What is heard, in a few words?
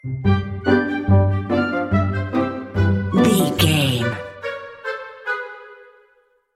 woodland critters play stinger